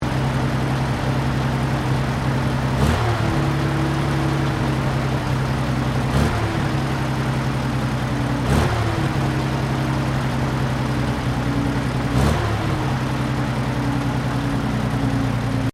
TANK CENTURION PRIMARY ENGINE REVS, British Centurian main battle tank; Trucks (Sound Effects:Vehicles)